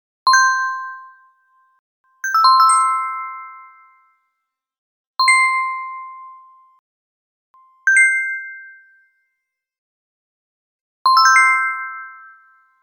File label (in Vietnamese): âm thanh tiếng ding dong